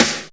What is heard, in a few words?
HOUSE SNRE; housey drms